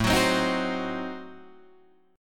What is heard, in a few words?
A Diminished 7th A down Guitar Open D